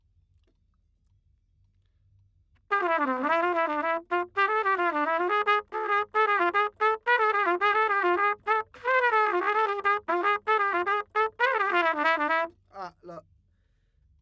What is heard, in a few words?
Trumpet